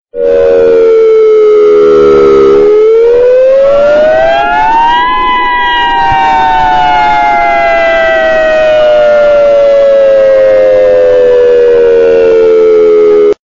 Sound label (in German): fliegeralarm